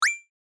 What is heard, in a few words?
coin collect retro 8 bit sound effect; gae wild jam